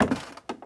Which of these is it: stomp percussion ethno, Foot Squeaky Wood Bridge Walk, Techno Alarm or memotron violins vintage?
Foot Squeaky Wood Bridge Walk